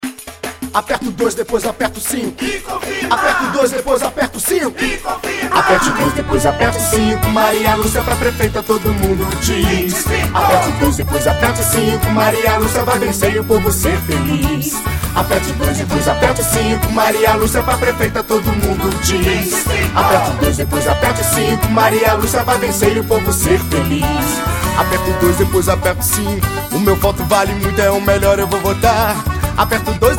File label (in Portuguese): Jingle para Prefeito